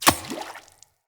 Throwing Knives Impact Water; Weapon Impacts; Environment

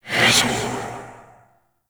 Grimoire Fire Whispers; Warframe; Tenno

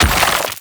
Stone Gaze Freeze Finish; Warframe; Brawler

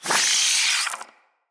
Root Spider Elite Vocal Pain, spider; Animals; Spiders; Vocals

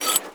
glyph eye open; Warframe; Duviri; Glyph Puzzle

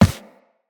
Run Default, Footsteps; Characters; Operator

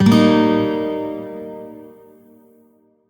Shawzin Pent Min Chord J; Music; Warframe; Lotus Shawzin

